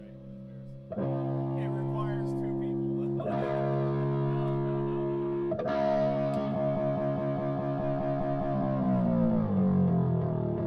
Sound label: little riff